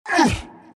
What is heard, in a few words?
grunts; abyssal underlord